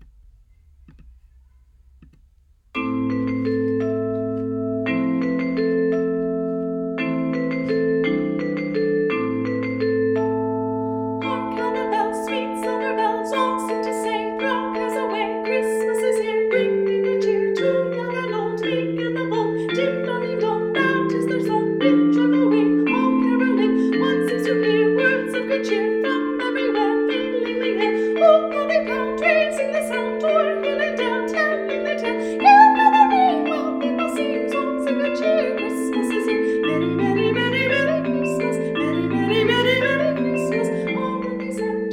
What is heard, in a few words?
Carol of the Bells Soprano